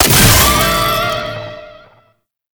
Pistol Last Shot; Weapons; Warframe; Tenno; Temple Signature

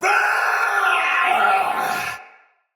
Battle Cry Soldier Sword Agent Male; Sinecure Melee

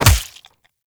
Melee Fist Impact Flesh; Fists

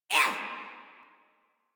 Pain Female, Battle Barks; Warframe; Modular Corpus Female

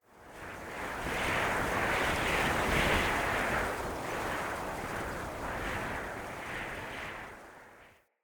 Mountain Wind Gust Whistle Soft

